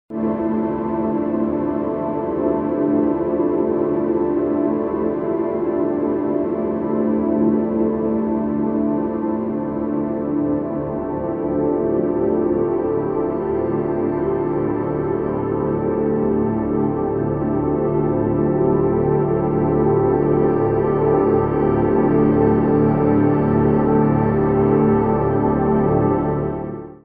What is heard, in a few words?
frozen ambience